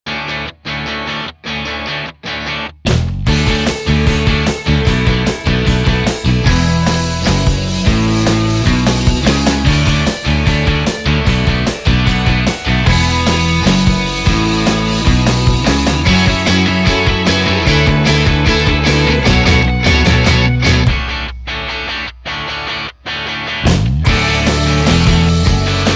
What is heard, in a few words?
Rock